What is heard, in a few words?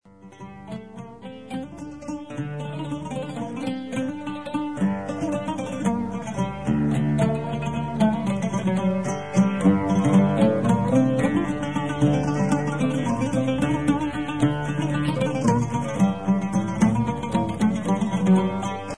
oud, Armenian musical instruments